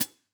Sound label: close Pd Hat, drum